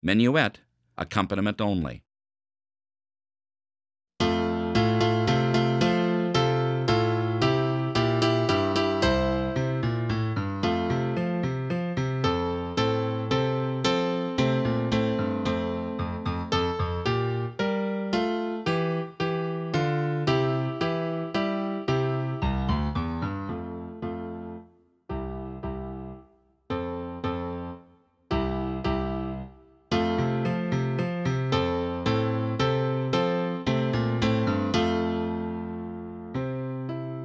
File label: Horn Accompaniment Only; French Horn; Piano Only – Performance Tempo; Menuett